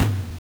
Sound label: Taiko Drum; Pocahontas; M8; Genesis